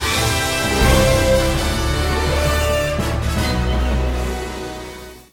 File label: Reward ~ You win!; beyond good evil